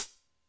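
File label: Cym Open Closed, Drum Machines Alesis D4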